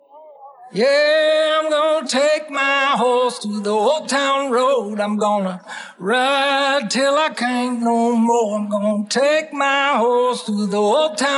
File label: vocals